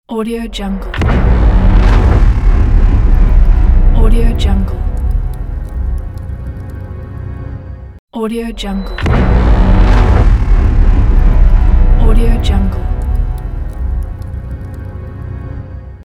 fire power logo

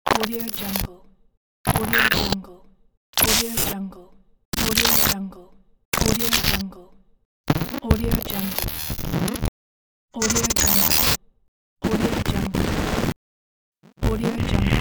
glitch

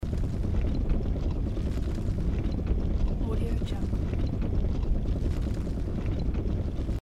rock spin loop

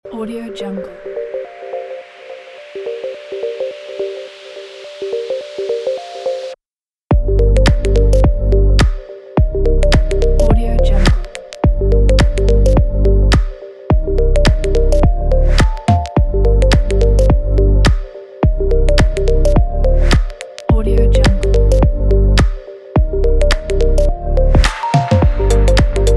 deep tech house

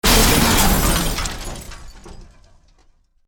car crash

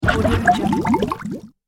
bubble

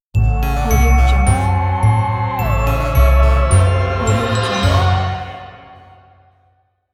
halloween logo

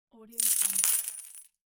coins dropped

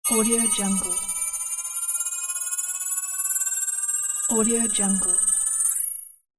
robot power up

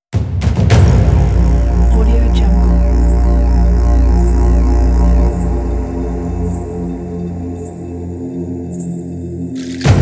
tribal

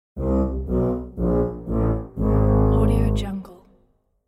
cartoon tuba fail; applehillstudios

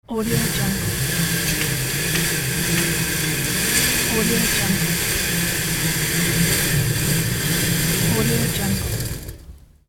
venetian blinds being let down; hollywoodedge

